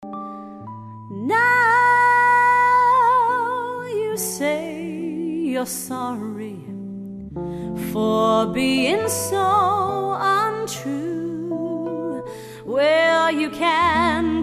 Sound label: jazz